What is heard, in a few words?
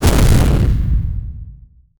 intense sound of flame bu